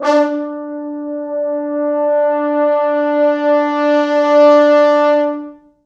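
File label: 4 French Horns, Horns; D3; East West; Quantum Leap Horns Sax; 4 FrHorns MOD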